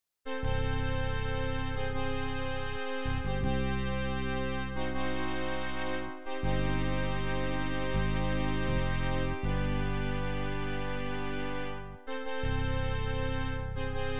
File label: Game Lose; Legal Crime